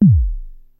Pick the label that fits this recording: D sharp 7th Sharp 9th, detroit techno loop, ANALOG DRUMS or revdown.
ANALOG DRUMS